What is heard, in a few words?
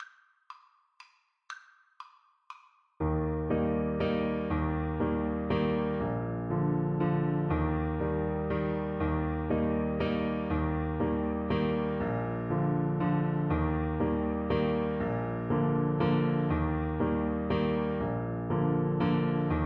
daar word, clarinet